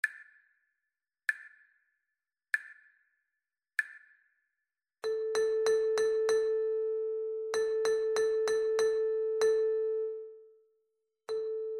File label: Rhythmic Rumba tika-tika